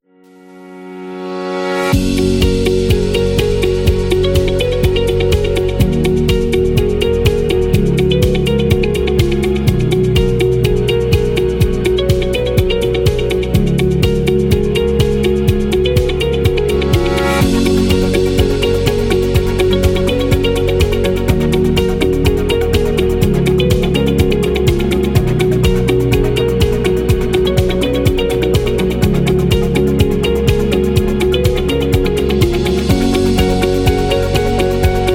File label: Corporate Medical